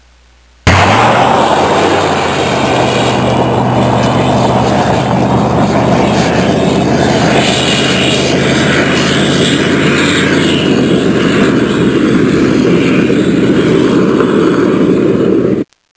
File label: Chrysler Speeding Away; 72 Chrysler; Vehicles